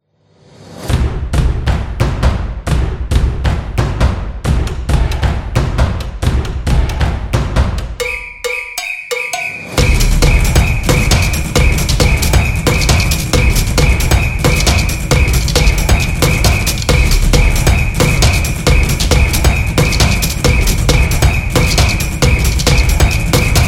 The Drum Stomp Rhythm

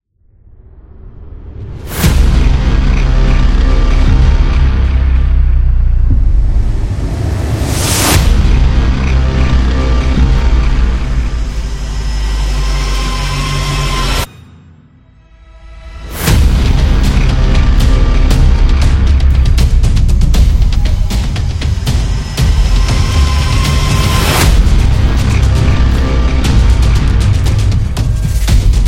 Cinematic Horror Trailer

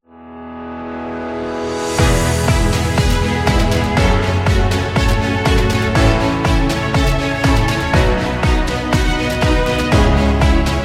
Dynamic

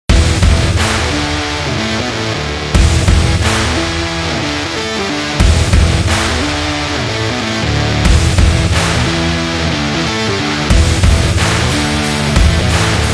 Stylish Indie Rock

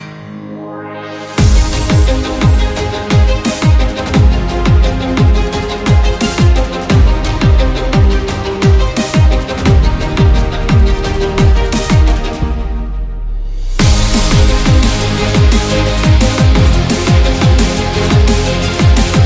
Epic Dubstep; epic action

